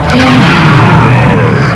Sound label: death; quake2; bruticus